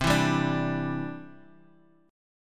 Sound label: C-Major Add 9th; strummed